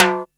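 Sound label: Timbale High, Drums, Roland MT32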